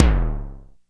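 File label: electronic drum; E-MU Formula 4000 Series Vol. 2; OrbitPercMaster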